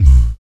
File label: VOC PERC, Vocal Planet Jazz FX BASS PERC